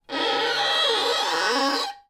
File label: Violin Screech Noise Mid; soundscrate